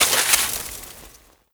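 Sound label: movement pick ice snow debris; footsteps; Call of Duty Modern Warfare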